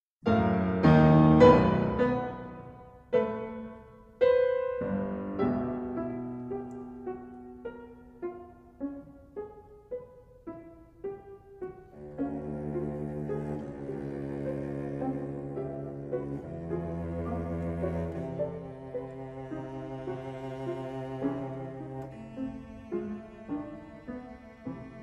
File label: Cello Sonata